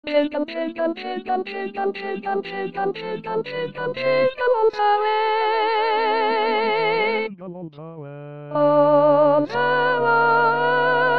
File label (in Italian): Jingle Bells 6 voci